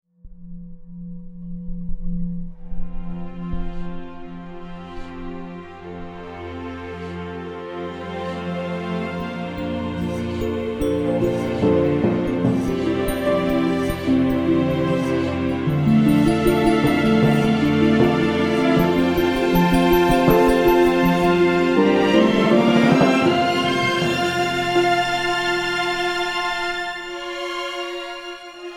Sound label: Strings